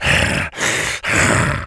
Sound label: zombie spawn; undying